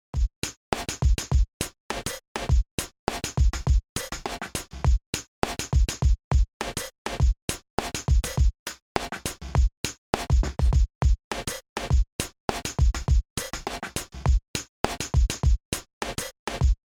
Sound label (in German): Drumloop mit Lookahead